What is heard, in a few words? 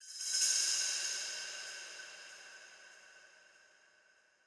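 Reverb Cymbal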